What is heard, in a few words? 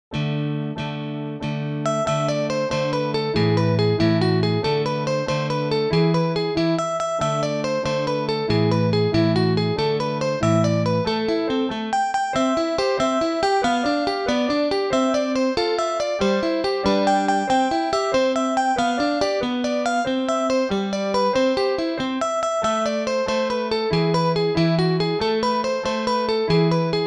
Tarantella; Tarantella ala Light2Art